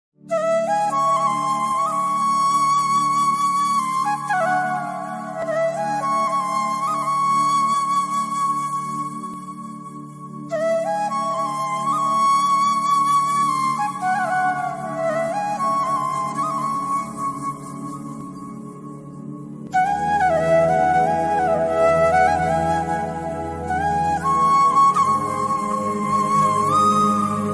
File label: flute music best ringtone bgm